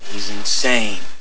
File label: menu popup